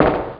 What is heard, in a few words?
walk; lab